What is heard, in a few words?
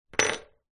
foley key mortice set down table gently